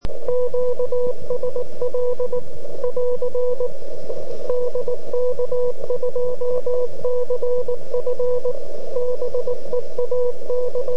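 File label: beacon, QRPP beacon 3530 KHz; Záznam signálu DK2CF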